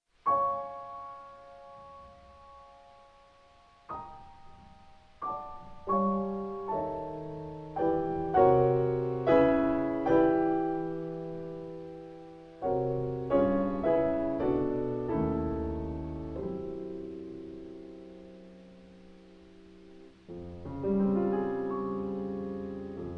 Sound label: piano; Trois Préludes pour piano